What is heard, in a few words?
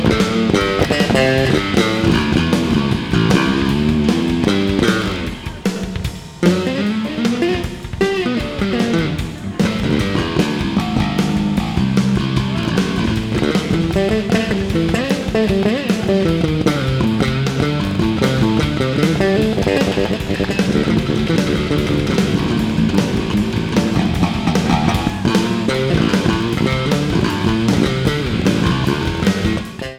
Bass Solo; West Palm Beach